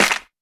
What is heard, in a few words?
clear clap sample c key, percussion single hits one shots